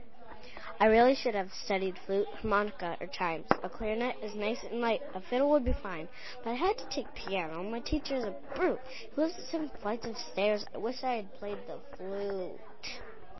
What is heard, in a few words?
Flute